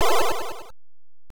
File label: Push Start; Sand Scorpion; arcade